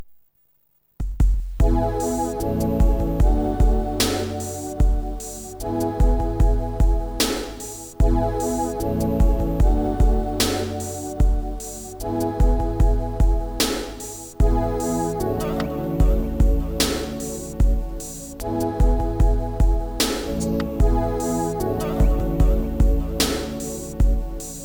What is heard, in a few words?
percussion & choir